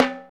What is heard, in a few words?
PER Percussion